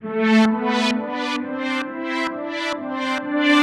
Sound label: 132 A, uk garage, Synths; Solipsa; 132bpm Lines n Loops